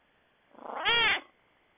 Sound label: cat meow